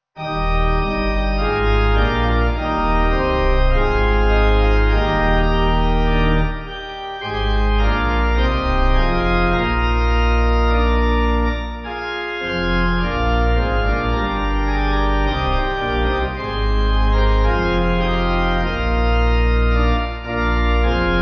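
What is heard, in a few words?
Pipe, Pipe Organ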